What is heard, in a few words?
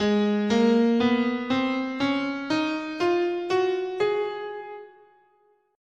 Ab Bebop Minor, scale